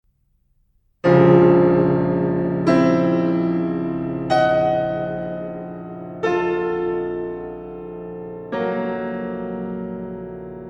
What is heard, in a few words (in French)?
musique contemporain; petipa 2021; fille superieur; variations contemporaines